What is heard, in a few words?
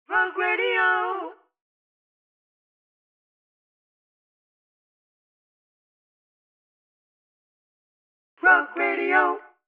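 harmony vocals 91 BPM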